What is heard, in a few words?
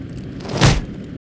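Mob attack; Bossmob; 욱일승천류; 야쿠모; 2단계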